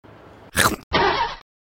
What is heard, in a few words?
Behind truck